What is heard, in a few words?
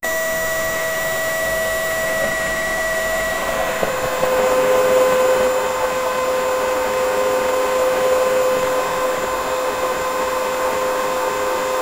the sound of a working, surveillance